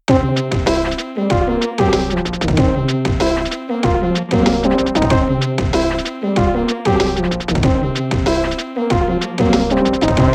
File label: Brass; Hypnospace Outlaw